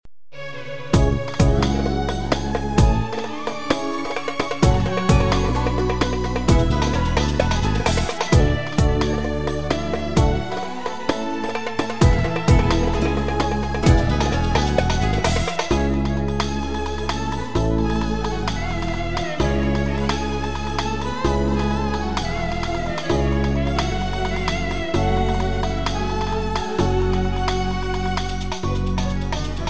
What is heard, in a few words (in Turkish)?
wahde, a1000